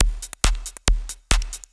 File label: percussion loops, Techno; PS-45